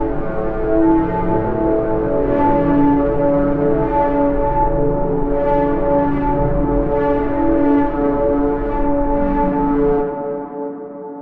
drone spaced F

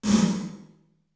REAL ROOM

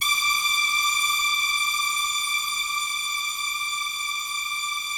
BIG ORCHESTR, Yamaha VP-1; Music Store; 90's Sample CDs

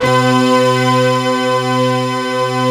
SYN BRASS, DANCE

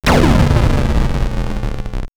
Elec FX Computer Game; X-Static Goldmine

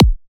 Kick Stompy

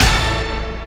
Hit, Roland JX-305

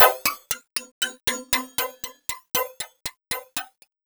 ASIAN MELO; USB Soundscan vol.33; AKAI; Disjointed Textures; RYTHME

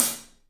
Paiste hat, drums; 3D Sound

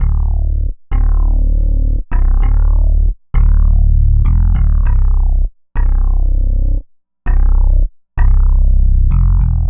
Synth Bass; Bass X

